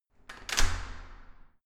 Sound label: entrance door close normal